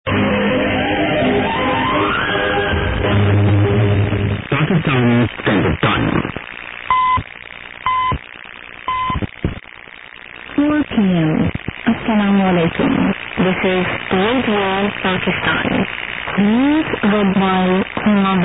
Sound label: 15100 kHz, broadcast; radio pakistan